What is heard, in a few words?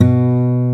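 B 1 MARTIN, acoustic guitar; AKAI S6000 CD-ROM - Volume 1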